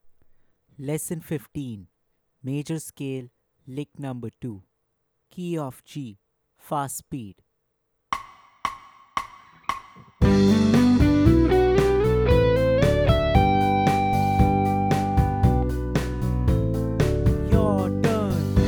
Major Scale lick Playthrough Fast Speed Key of G, lead guitar; The Art of Lead Guitar; Major Scale lick 2